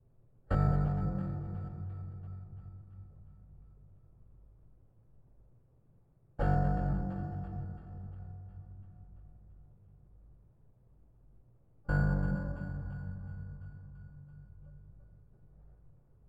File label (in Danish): Guitar meget dyb